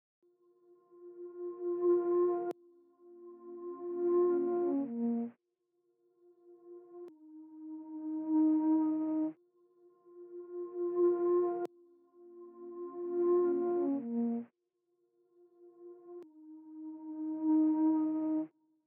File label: 105 BPM D# Min Reverse Flutes; Loop Stems; Cymatics; Infinity Melody Collection; New Ting